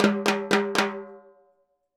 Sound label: Timbali, Latin Percussion